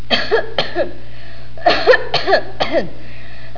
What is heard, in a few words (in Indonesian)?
Batuk